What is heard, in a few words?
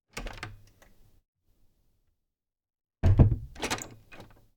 Office Dwarf Door; Doors and drawers; Fischer